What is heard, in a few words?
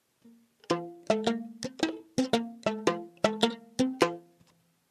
wagomu karinba